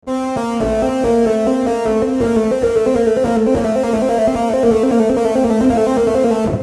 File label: legato